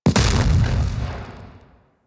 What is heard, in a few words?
Explosion Small; Warframe; Weapons; Thanotech